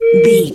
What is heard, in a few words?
whistle slide straight tone med